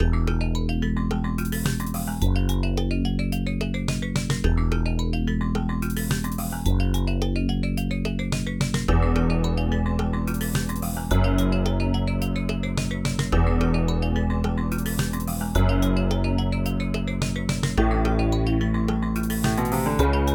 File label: Ambient; Dune 2